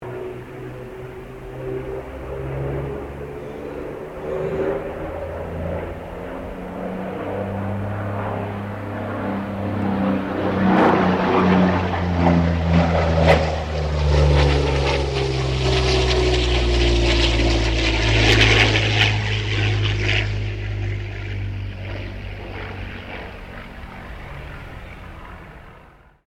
Aircraft P-40 Kittyhawk barrel roll, WW2 Kittyhawk or Warhawk fighter plane; 1940s; Airplanes